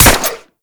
Harpoon Gun Shot 3D; Warframe; Sharkwing; Grineer

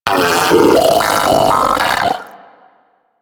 Arachnoid Vocal Patrol; Warframe; Corpus; Arachnoid Hunger

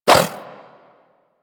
Inf Throwing Star Whoosh, Infested

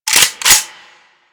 AK-47 Chamber; Warframe; Kalashnikov; Reload

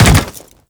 Step Default; Leto

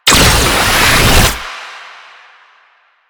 Gunbrella Alt Charge Up, Weapons; Warframe; Tenno; Koumei

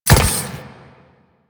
Moa Step; Warframe; Quests; The New War; Veso

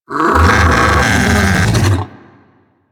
Vocal Alerted; Warframe; Hunting Critters; Undazoa

